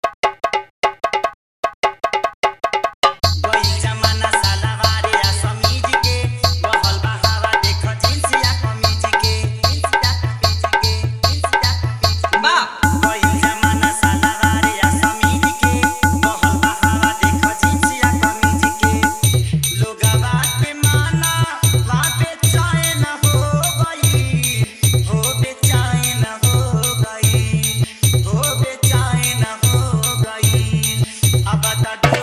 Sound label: Hard Vibrate Bass Bhojpuri Dj ReMix